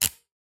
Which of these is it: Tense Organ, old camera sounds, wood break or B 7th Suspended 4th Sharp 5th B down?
old camera sounds